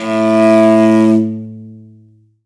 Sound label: R, morin khuur, World Instruments